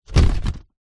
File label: foley cardboard box down on soft surface